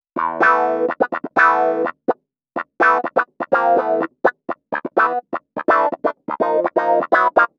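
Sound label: 125 NuJazz Clavinet E major; Piano; Clavinett; Pro Tools Essential Loops; Digidesign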